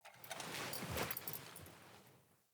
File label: Foley Actions Default Sit, Default Clothing; Player